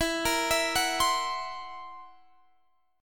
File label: Fsharp 7th Flat 5th, guitar chord